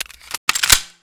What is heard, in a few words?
deagle reload cylinder arm; tf2classic